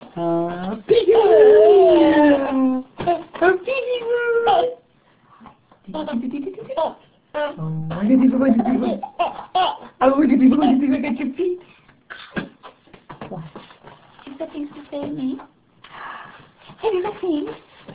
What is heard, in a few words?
laugh; winter; fertility